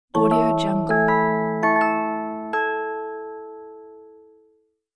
music box magic ding; interface sounds

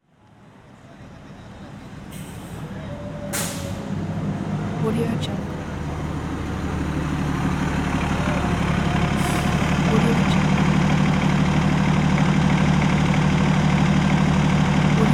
transit bus approach stop pull away

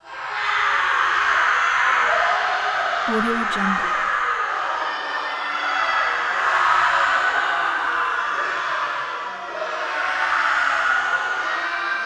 humans screams crowd screams torture reverb; human sounds

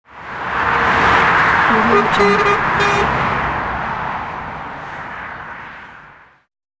traffic semi truck honk blast

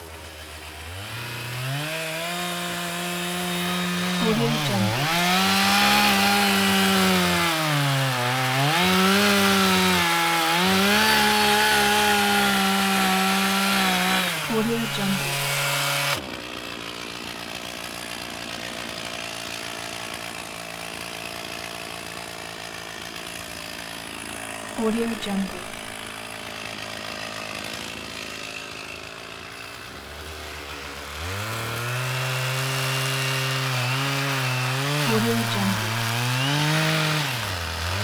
chainsaw sawing; اره برقی; urban sounds

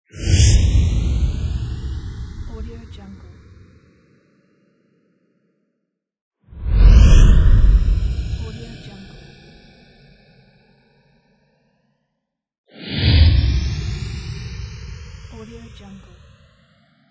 rising whoosh; transitions and movement

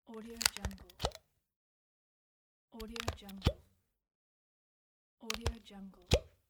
oral irrigator reservoir detachment; domestic sounds

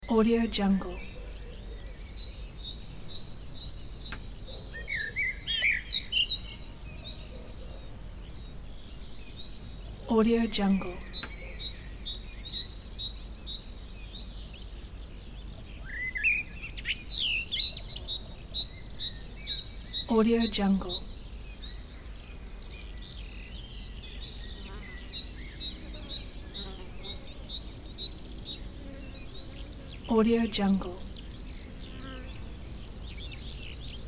summer morning village meadow ambience; روستا در یک صبح تابستانی

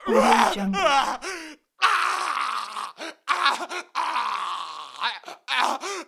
male torture screams; مرد شکنجه شده

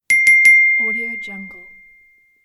ding ding ding

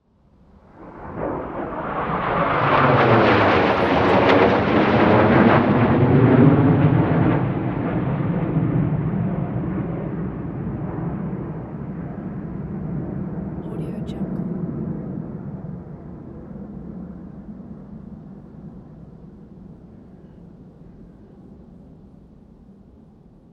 three military jet planes flying by

